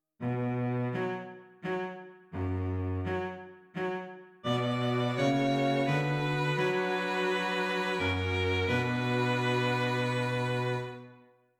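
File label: String Quartet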